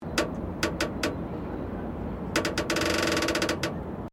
PIER CREAKING, Pontoon pier creaking with the tide; Creaking Sound Effects; Creaking (Sound Effects:Objects)